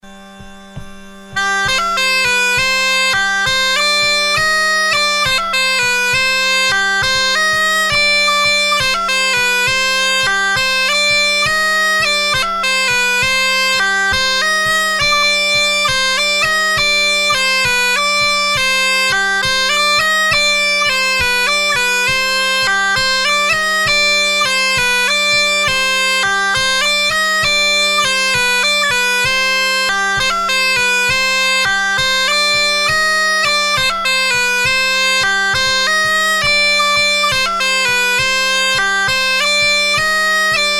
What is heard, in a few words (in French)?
bourree; papillon